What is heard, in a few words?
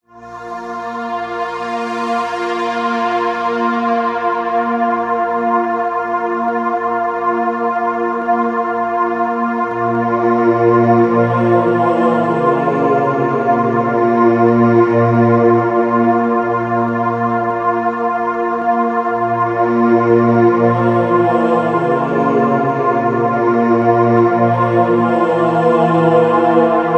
ethereal voices